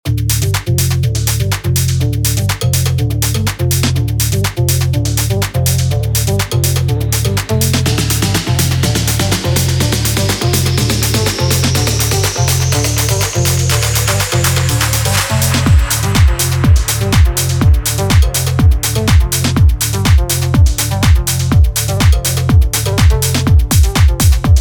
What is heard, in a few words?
House one shots